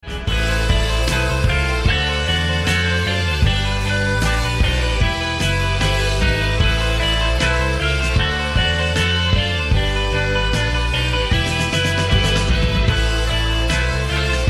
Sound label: christmas kids ringtone